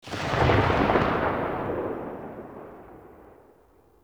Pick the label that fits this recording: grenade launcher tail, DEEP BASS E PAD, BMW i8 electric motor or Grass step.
grenade launcher tail